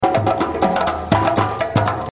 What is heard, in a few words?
djembe